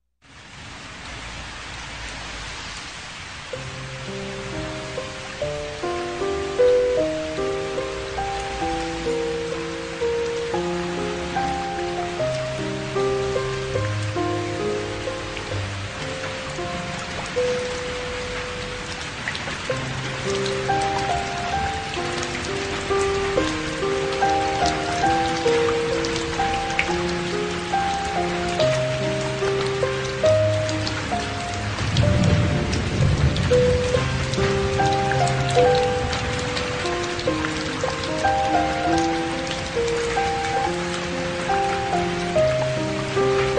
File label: Piano in rain